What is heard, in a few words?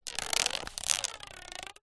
rubber band around newspaper movement; foley